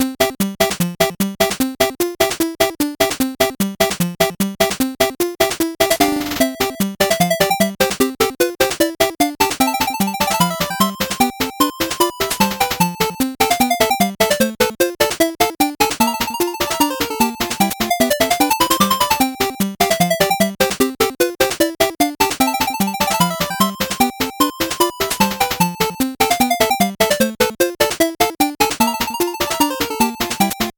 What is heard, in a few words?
8bit bgm normal